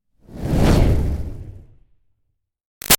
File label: Fireball Whoosh Flamey Huge; Whooshes; Sound Design; Whoosh Sound Effects